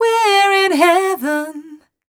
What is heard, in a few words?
f mel e flat, fem Vocals